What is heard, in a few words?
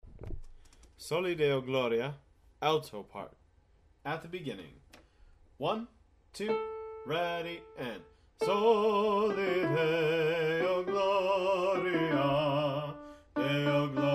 Alto, choir